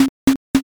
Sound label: knock